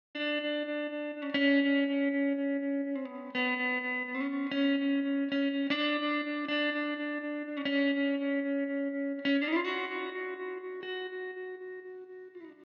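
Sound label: original echo guitar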